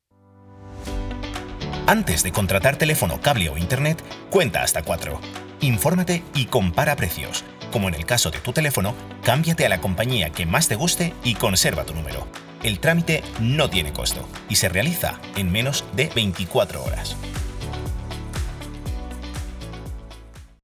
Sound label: jingle